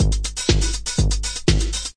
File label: 122 bpm, fast phase, drum loops and breaks